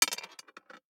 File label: DICE on Glass, Throw and Roll, Standard, 1 One Die; Samples; ArticulatedSounds; DICE Sfx Party (Extended)